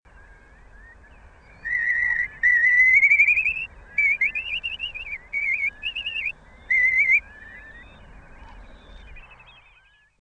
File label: Slender-billed Curlew Numenius tenuirostris